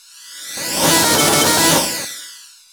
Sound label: REVERTED; Spectrasonic Distorted Reality 1